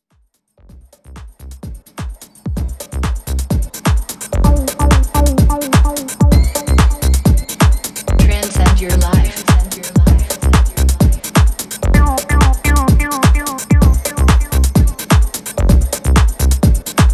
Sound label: Piano Mix